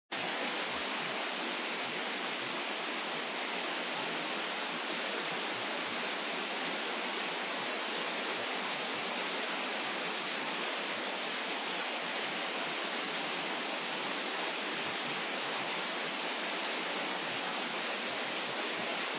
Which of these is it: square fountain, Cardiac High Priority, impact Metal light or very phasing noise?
square fountain